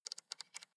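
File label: sports car interior volume button spin soft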